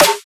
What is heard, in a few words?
Snare